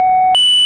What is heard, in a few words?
Warning Tone; knights try